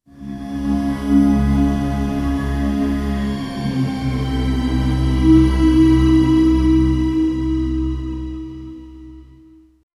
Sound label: scary shocking music sound